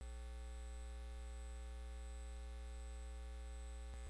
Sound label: car